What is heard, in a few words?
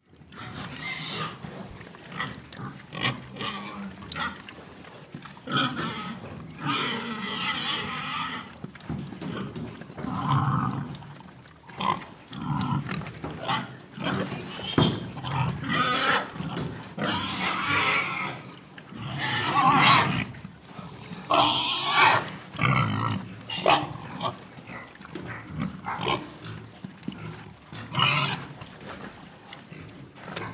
piglets